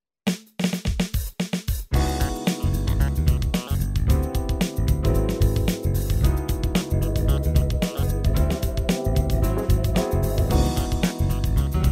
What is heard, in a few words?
rd-600 moving waves; MIDI environment from the 1990’s; keyboard emulation